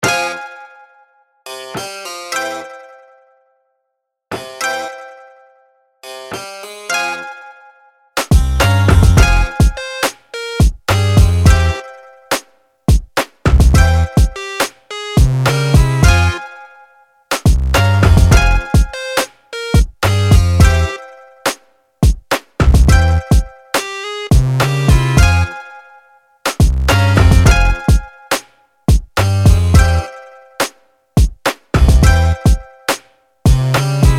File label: double spinet, VIVID VETERAN; The predecessor of the piano can enrich your track with unique colors. We added a second a; CINEMATIQUE; KLANG